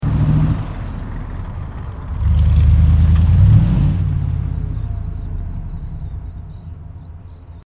4wd humvee manouver

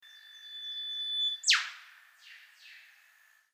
bird eastern whip bird call

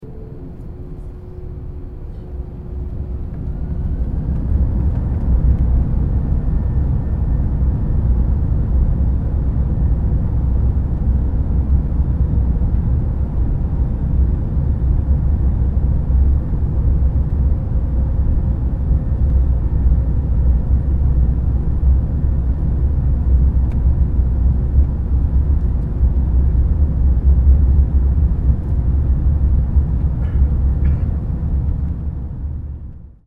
aircraft 1995 a320 airbus takeoff interior stereo

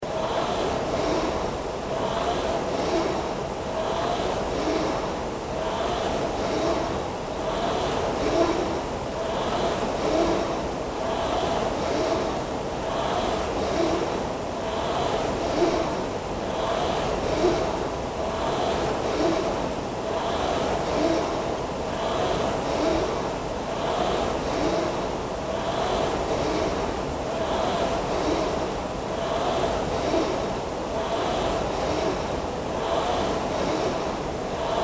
gym rowing machine operating loop

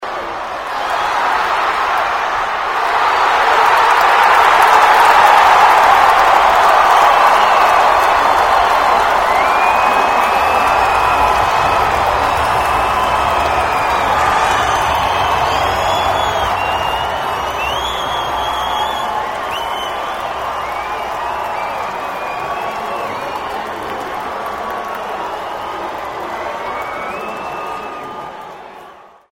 ambience worldcup rugby crowd cheer loud stereo

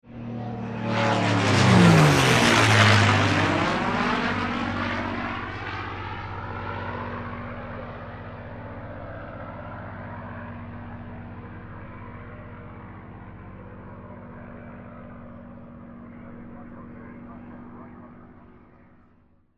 aircraft cac ca-18 mustang mk23 pass overhead

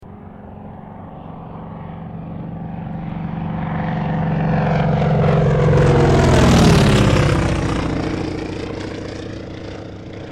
aircraft cac wirraway pass overhead; 1945

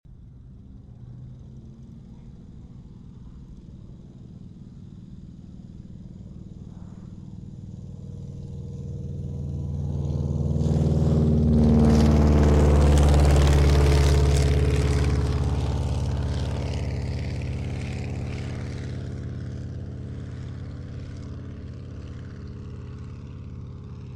aircraft avro 643 mkia cadet takeoff stereo

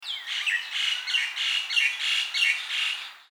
bird common myna call

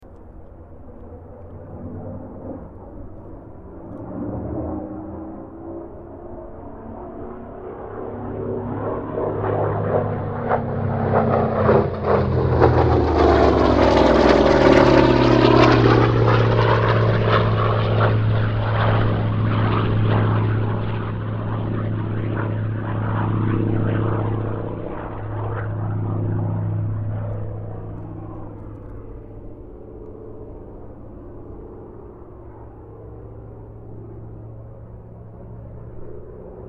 aircraft hawker seafury pass; 1945

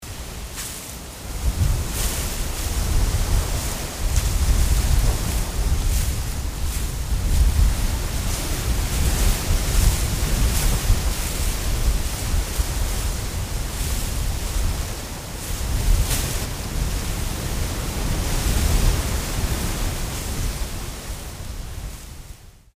wind palmtrees

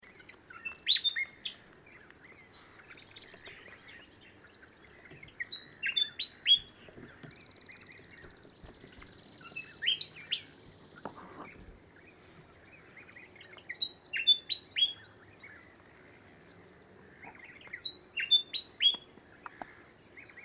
bird unknown magroves sequence

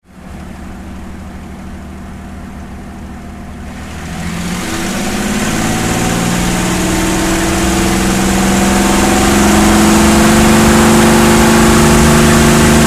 aircraft cac ca-18 mustang mk23 takeoff interior

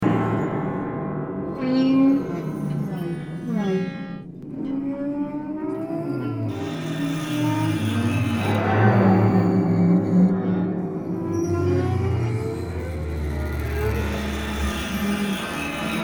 ambient texture

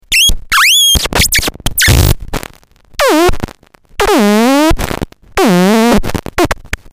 electronic feedback unusual

